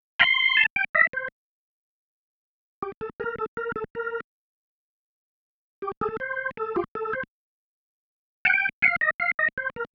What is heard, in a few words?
Single Riff, Organ